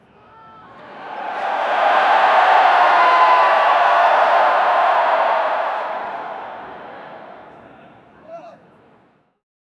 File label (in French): une foule immense et enth